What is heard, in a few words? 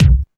BD, Dance Mega Drums; Best Service